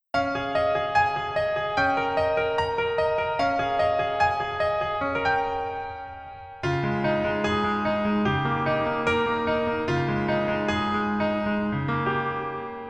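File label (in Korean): Piano version -1키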